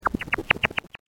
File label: chipmonk